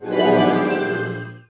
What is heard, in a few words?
dilectio heal